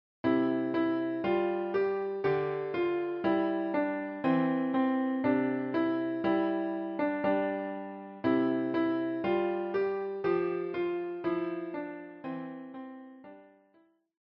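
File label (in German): Freude schöner Götterfunken, Klavier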